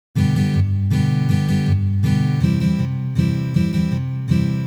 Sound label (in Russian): Valsovyj boj na gitare